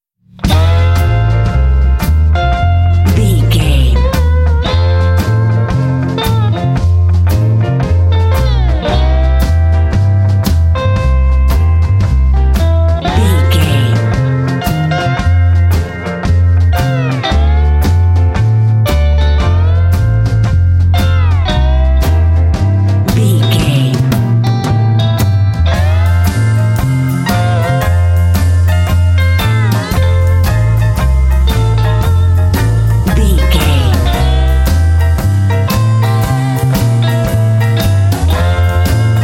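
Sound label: lets slide dobro bed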